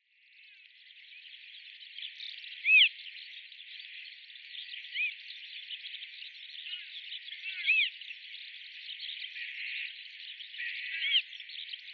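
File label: eastern marsh harrier, watarase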